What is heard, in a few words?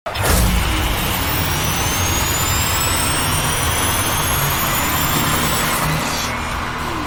nitrous; nfs hp 2010 gamescom